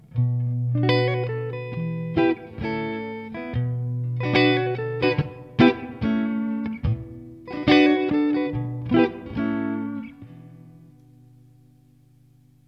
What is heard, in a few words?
rock clean, guitare